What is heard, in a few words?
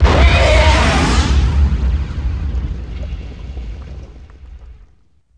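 combat explode tie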